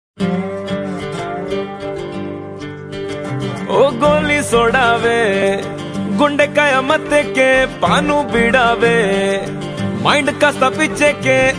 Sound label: song ringtone song bgm movie bgm telugu ringtone; Ginna